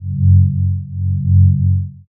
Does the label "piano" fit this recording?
No